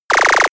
laser shot

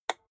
rotary control single turn hard

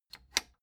plastic button latch squeak click disable fast

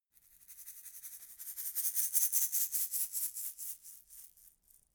shaker plastic egg rattle dynamic movement

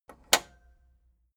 tape deck close soft

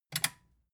metal button click power off fast

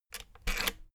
rotary control plastic rattle turn

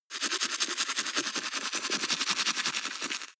teeth brush wet open front fast

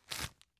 tissue take

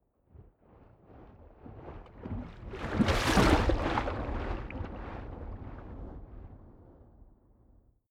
waves splash

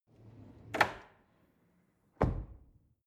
drawer cutlery close draw short

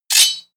sword pull out

